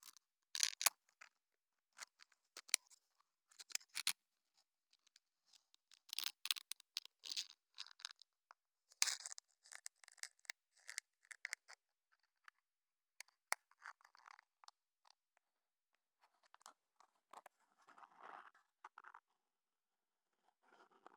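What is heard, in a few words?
Creature Small Feeding Scratching, Microbes; CREASmall; Hzandbits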